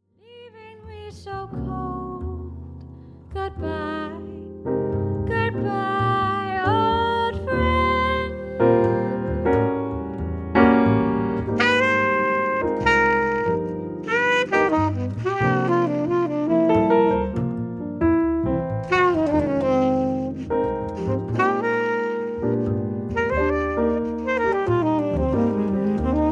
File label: Goodbye Waltz